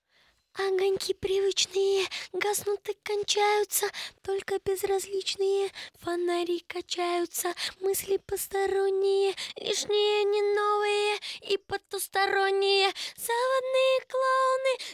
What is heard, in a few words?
cuplet